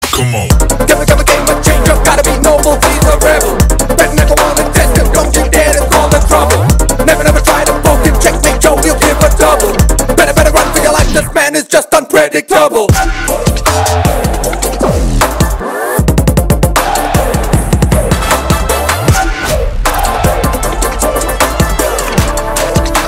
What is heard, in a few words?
Song Ringtone; telugu; game changer